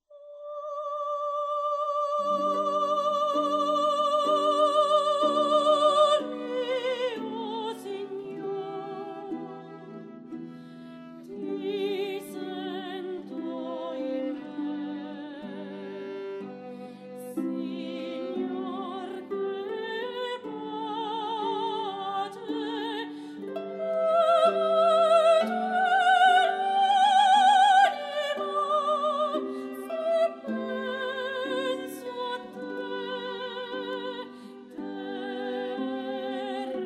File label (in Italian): arpa violino soprano; O mio Signor; Haendel; matrimoni